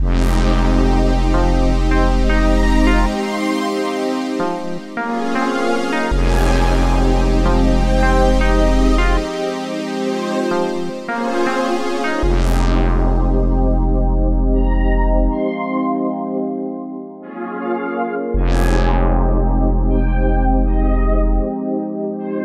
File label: scanner 157 Fm